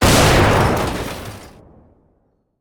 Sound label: metal; combat; weapons; rocket